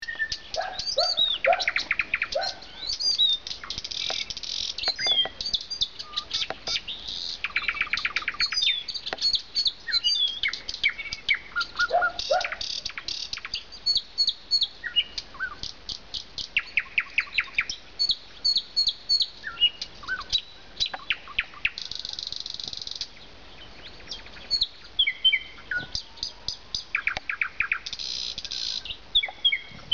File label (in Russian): solovey, zvuki ptic